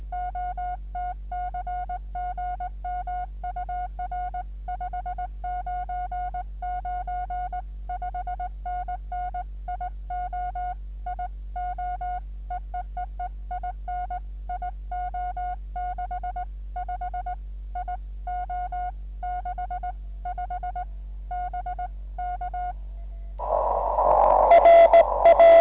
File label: cw; VU2RM; cycle23; 6M; GI0OTC 50Mhz, VHF/UHF, HF Utility and Military monitoring